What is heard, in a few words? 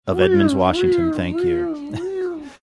making ambulance sounds; while thanking donor